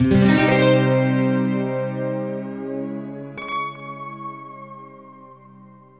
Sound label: ELECGUIT, AMBNT, 160, C; eJay; Ambient; Guitar